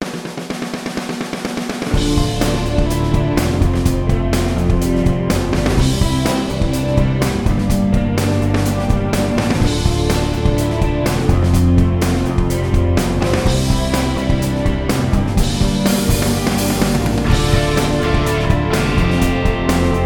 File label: 125bpm D minor; song in a hat 2025